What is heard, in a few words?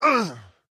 pain; STALKER; IWP